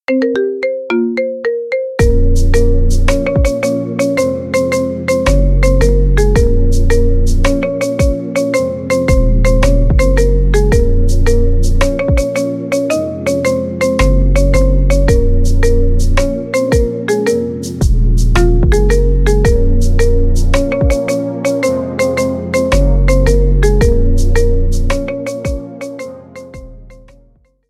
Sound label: marimba remix